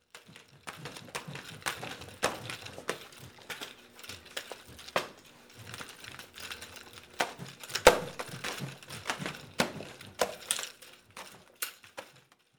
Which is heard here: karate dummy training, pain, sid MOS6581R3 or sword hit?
karate dummy training